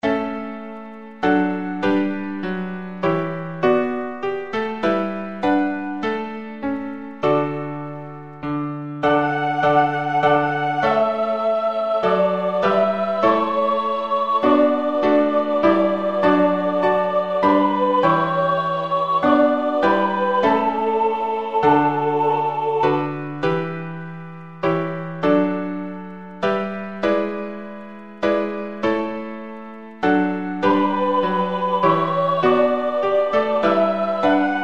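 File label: Agnus Dei soprano; Charpentier; Minuit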